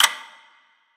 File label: Clock Tock